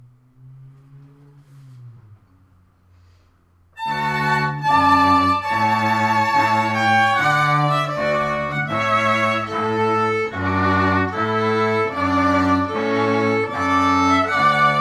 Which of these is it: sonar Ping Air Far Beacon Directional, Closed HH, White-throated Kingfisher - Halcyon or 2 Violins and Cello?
2 Violins and Cello